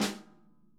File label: SNARE, Interactive Drum Kits